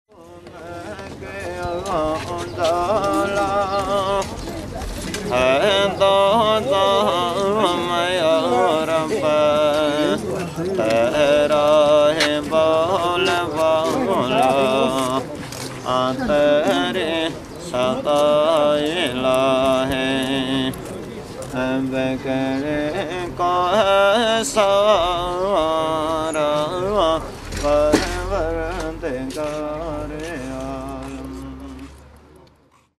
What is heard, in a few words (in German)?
Pakistan Gilgit Saenger